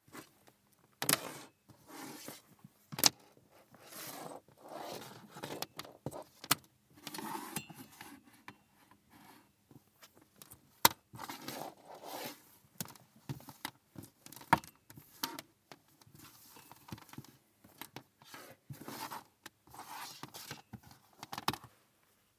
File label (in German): Mikrokabel verlegen